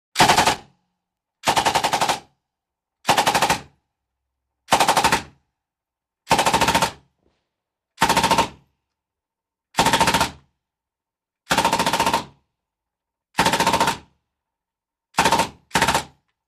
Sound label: M16: INT: Firing bursts indoors, through; WEAPONS - RIFLES M16: INT: Firing bursts indoors, through window.